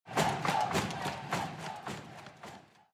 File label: Cheer